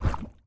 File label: Step Lava; MiSide